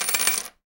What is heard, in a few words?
coins